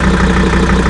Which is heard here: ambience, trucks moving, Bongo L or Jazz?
trucks moving